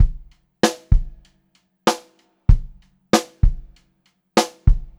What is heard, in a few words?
BEAT, Pop-Rock Drumloops; USB Soundscan vol.37; AKAI; 96 POP